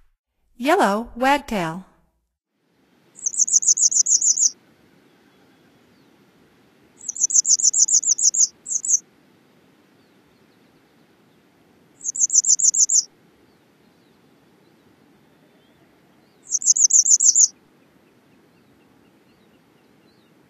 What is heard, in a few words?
Yellow Wagtail, Songs of Birds of North America; Stokes